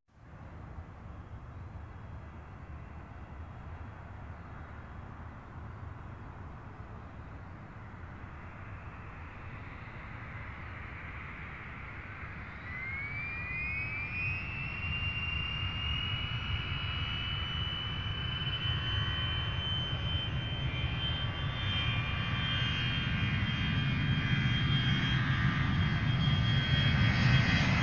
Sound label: Aircraft 737 Taking Off; KMP Film Video Sound Effects